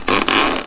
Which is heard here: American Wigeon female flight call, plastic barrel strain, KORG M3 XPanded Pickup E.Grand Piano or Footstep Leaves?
plastic barrel strain